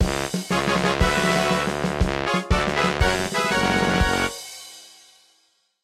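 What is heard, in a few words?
Jingle RPG win; Yuru Yuru Gekijou Gekijouban 2 3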